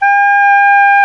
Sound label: SAX SOP MF G, Soprano Sh